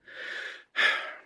annoyed sigh

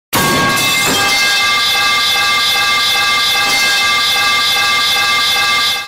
long metal pipe clang

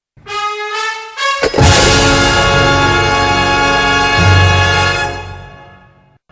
stinger; mgs3

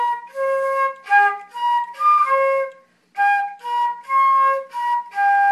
playing on the flute

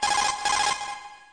codec send

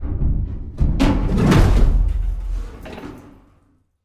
xeno ceiling vent fast

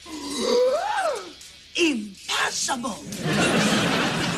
gasp

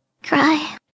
cry

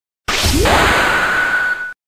limit break; final fantasy vii sound effects

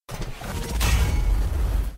sunbreaker

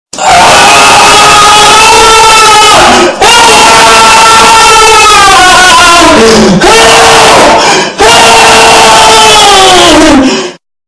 scream bass boosted

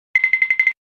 cartoon tiptoe

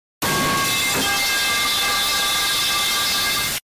metal bar drop

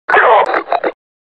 hit; combine

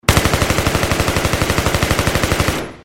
g36c auto inside

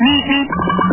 beep beep

